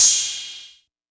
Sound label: kit, Yamaha Motif-ES8